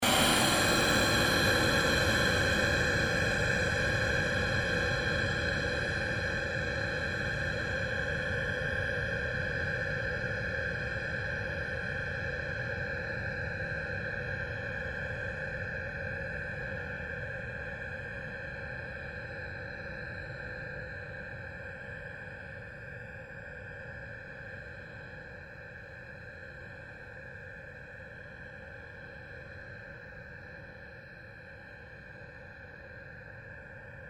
pulse fade long, Various sweeps, pulses and drones; Rise And Dive; Rises and Dives